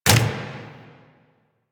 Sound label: Turret Dry Fire; APC; Warframe; Lasrian